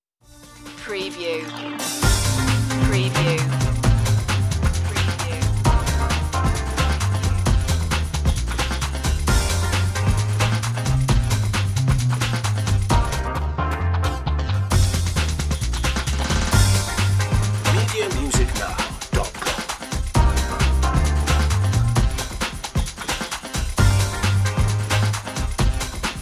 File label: funk